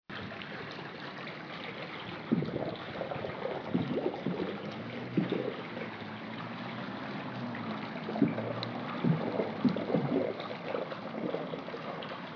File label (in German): niagara falls grundrauschen